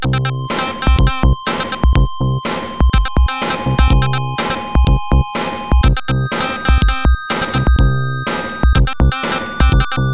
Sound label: Telegram (w SFX); instruments of chaos starring young indiana jones